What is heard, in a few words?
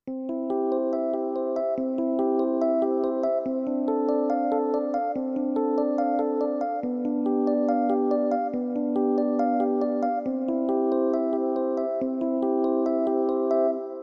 El Piano, Yamaha Clavinova CLP-270; I SUONI - GLI STRUMENTI XG